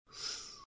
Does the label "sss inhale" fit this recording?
Yes